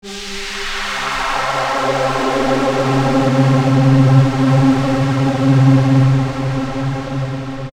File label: sample pads, jungle; Jungle Frenzy 1